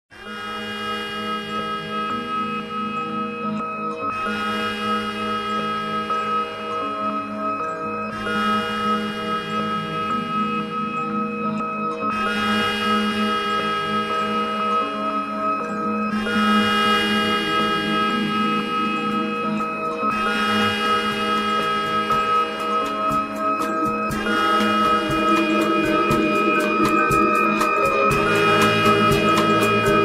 Sony Ericsson Z555i ringtone

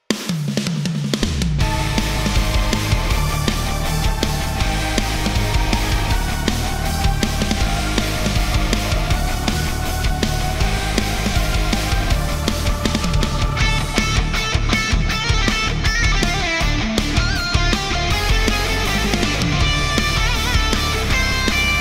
METAL GUITAR COVER; Super Meat Boy

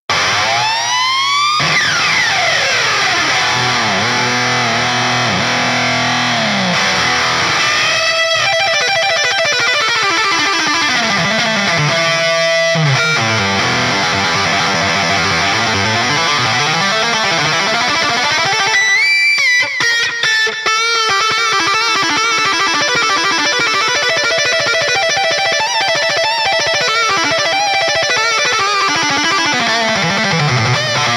Metal; THUNDER FORCE IV

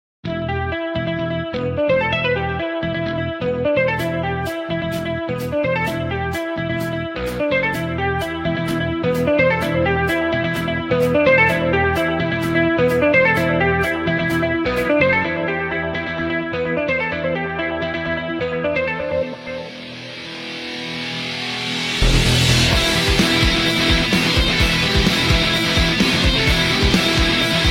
Metal Cover; Geometry Dash

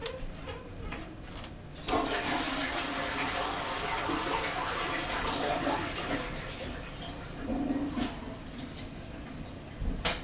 toilet

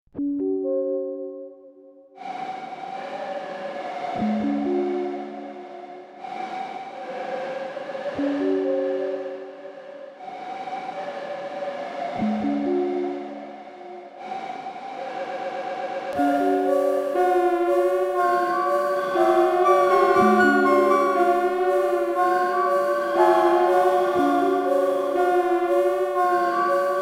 Slowed Down

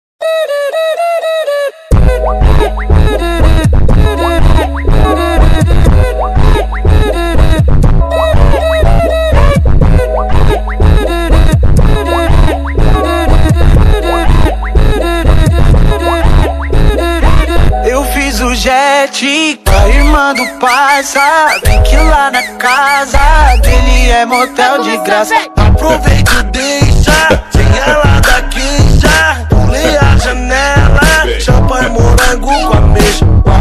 Funky Brazil

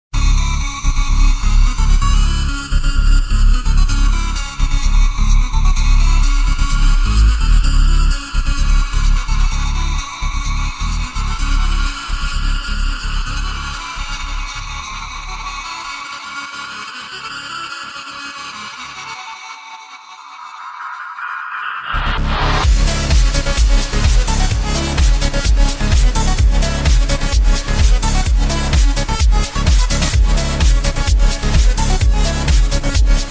Clubstep

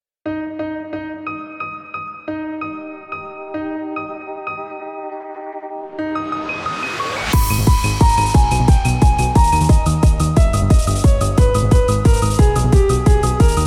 remix

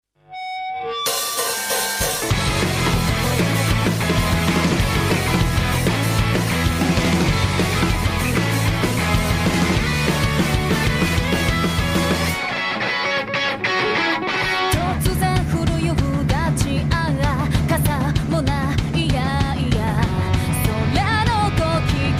Guitar

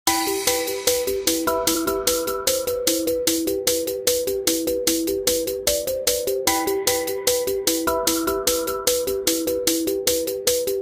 glitch hop

